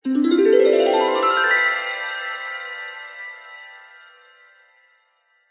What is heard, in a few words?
sound design harp glissando dreamy washy; zapsplat